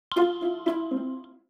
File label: Sonic Branding